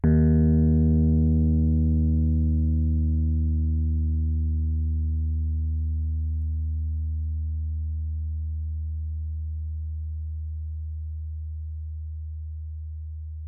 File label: D#2, Rhodes Mark II; CEPCo Sample Series Vol. 3